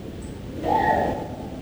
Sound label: Yell